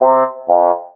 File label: lose